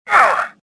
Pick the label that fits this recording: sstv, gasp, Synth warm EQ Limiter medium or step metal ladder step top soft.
gasp